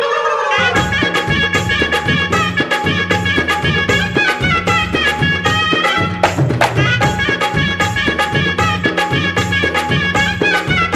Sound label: Gana Music